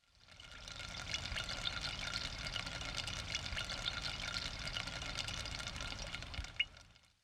eau

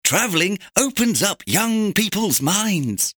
Clic A; MOBICLIC 74; TCHATCHE

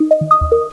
Téléchargement; First Class

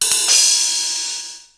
cymbale; MOBICLIC

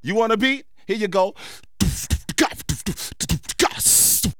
Choeurs & effets; L'Effet Pommier - Volume 04

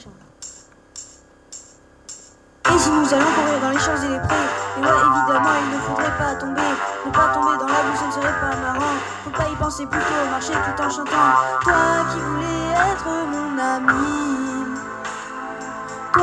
Chanson interprete

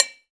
BRIQUE; Ultranium 3D

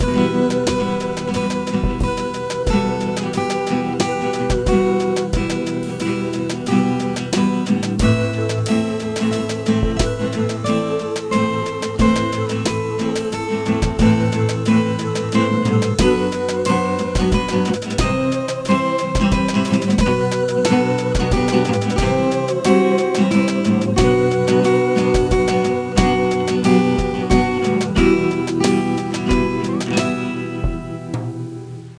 Jeux; MOBICLIC 13

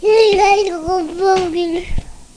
bébé; MOBICLIC

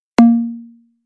ZONE CLIC; MOBICLIC135

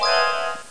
gagné

